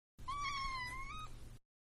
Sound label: Cat-Meow; Cats; Animals; Cat-Meow 4; Manhattan Production Music